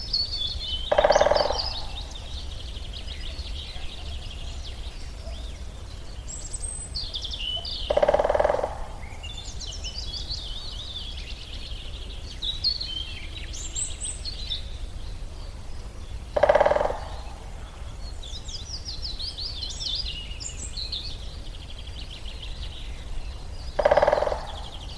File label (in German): buntspecht klopfen; vogelstimmen; nabu; lars lachmann